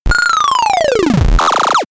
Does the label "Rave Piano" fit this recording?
No